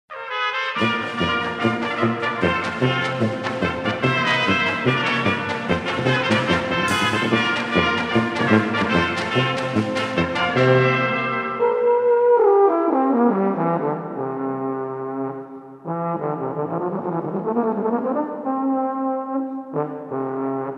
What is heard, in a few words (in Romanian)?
Mars